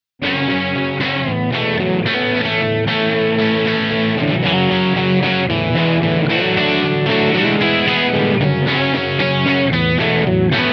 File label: MAXON OD9PRO+ Bypass